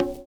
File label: PERCURT; Univers Sons - Kitch 70s